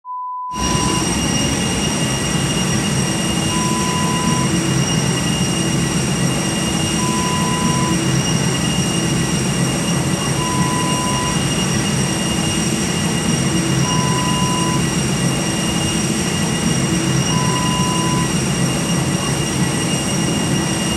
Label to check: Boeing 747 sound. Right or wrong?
Right